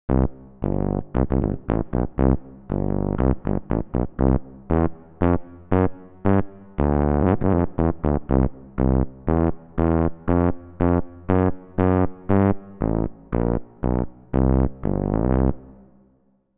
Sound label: Tuba; solotrumpet